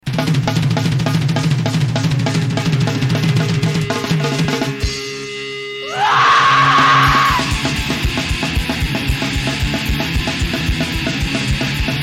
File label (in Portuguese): hardcore